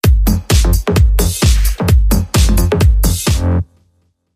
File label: House Beat, Sting